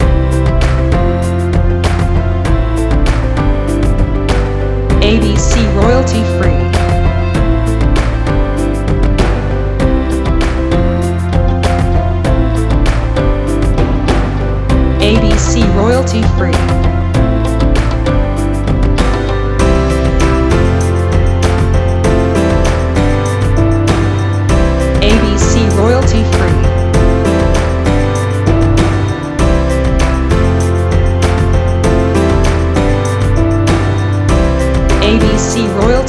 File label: Inspire Corporate Business loop